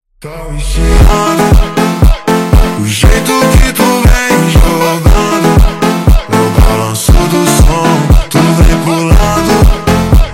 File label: MONTAGEM Slowed